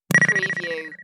Short Ring

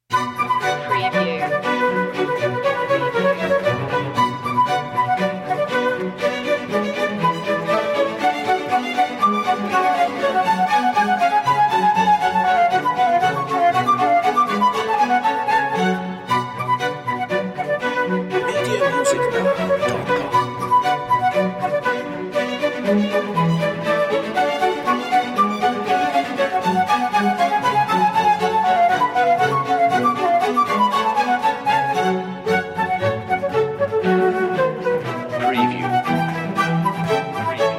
orch suite badinerie; bach; no2 bminor; bwv 1067

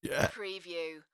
Male Burp; beanstalk audio

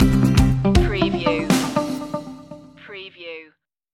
undiscovered light stinger

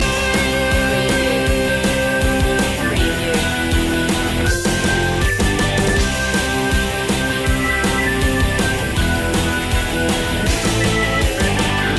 punky loop

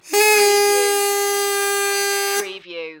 Party Horn

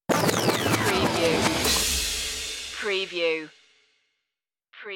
crash course stinger

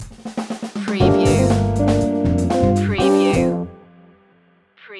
funky moves stinger

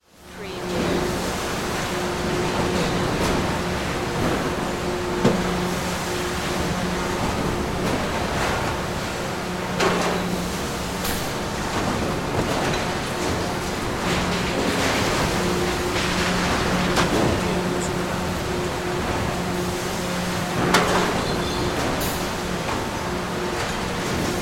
Factory Machines

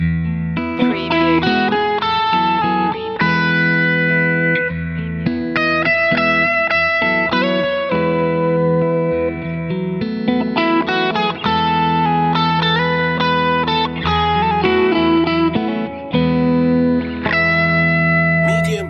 guitar game loop

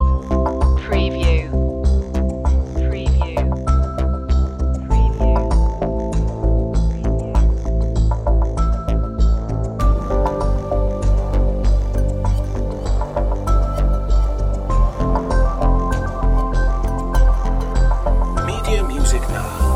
digital evolution ambient bg

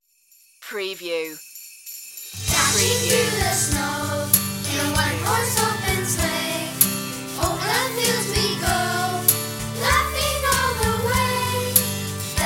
children children group vocal

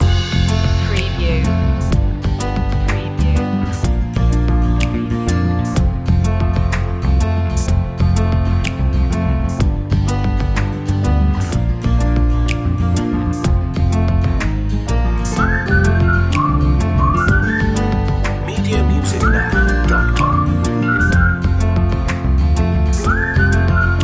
kids playing main loop

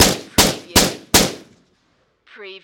Machine Gun Sig SG5

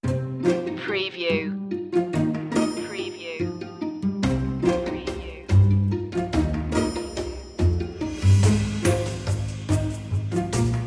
curious machine underscore

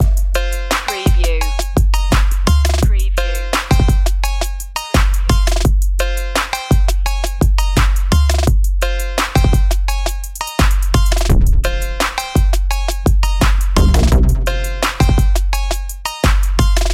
hip trap loop

